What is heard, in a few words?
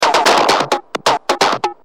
Dist, Industrial, Drums Perc